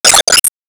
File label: Gatling Vanish